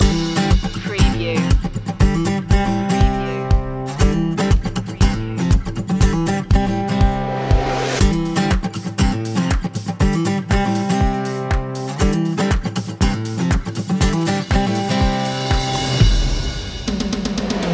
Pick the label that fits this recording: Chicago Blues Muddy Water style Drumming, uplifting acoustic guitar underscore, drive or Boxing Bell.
uplifting acoustic guitar underscore